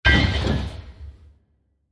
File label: Metal Impact Blast With Various Small Particles; Crafted Signals